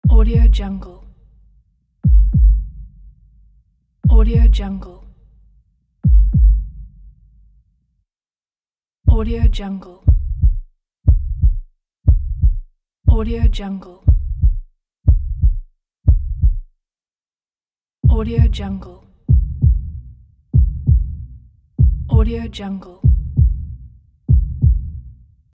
heartbeat

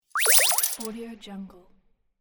coin sfx

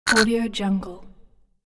short pain critter monster voice

